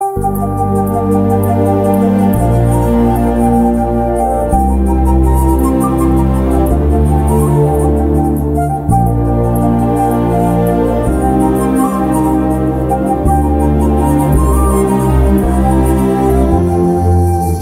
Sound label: Flute BGM; Ala Vaikunthapurramloo; Telugu